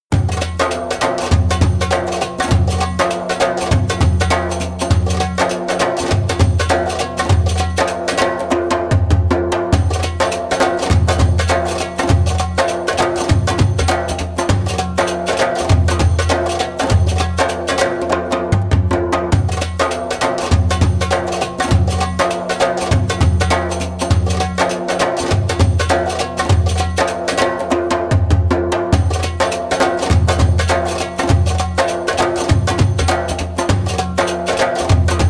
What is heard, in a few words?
Funky Cifte Telli (fast) spoken, Djembolale, Grooves and Moves